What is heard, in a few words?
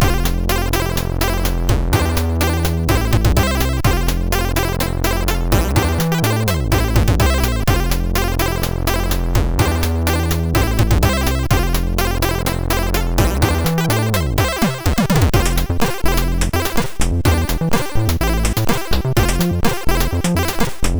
tune 1 sid MOS6581R2